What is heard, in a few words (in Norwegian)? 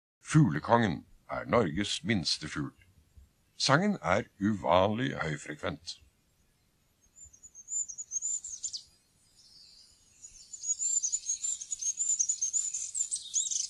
fuglekonge; fuglelyder; Fuglene våre